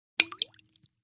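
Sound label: Splash Small Plop Glass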